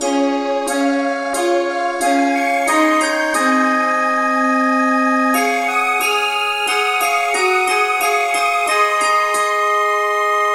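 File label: choir, key C sharp; SAINTTHOMAS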